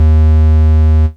Root D#, Bass

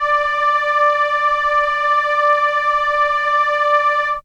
Horn D5, ARP Solina; musicradar-synth-samples

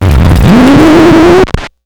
Needle Drag, Vinyl Record Samples

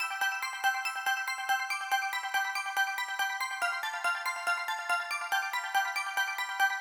Bells, 141 BPM; Vengeance Samples; Once Upon A Time

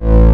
Offbeat Bass A; Vengeance Samples

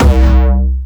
C2, Jumpstyle Kick; vengeance essential club sounds-3; VEC3 Bassdrums

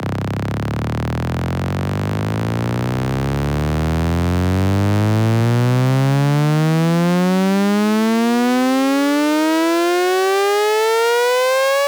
128BPM Siren; FX Fills; 8Bars; Vengeance Samples

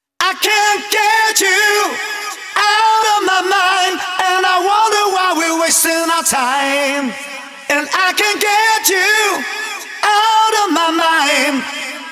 Male Vocals Chorus WET, 128 BPM; Vengeance Samples; VTDS2 Song Kits

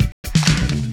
Fill Ins 128BPM; Vengeance Samples; VEH2 Loops

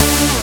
Synths D; Vengeance Samples

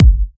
Hard Kicks; Vengeance Samples

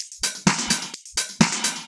Electro Loop 128 BPM; Vengeance Samples

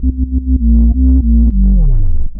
Roland B Line, Roland S100

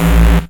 Synth Bass; Vengeance Samples; VEE Synth Shots

